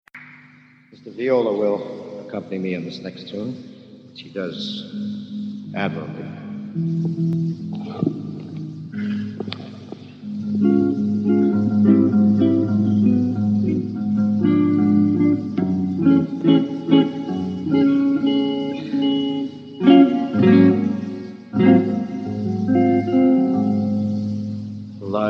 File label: viola